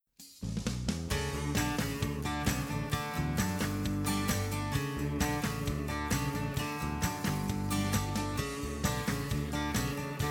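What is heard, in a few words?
Tenor Bass, gospelchor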